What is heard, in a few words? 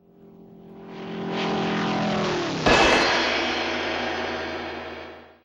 air crash, Vehicles